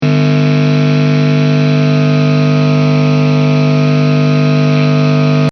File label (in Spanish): Ruido True Bypass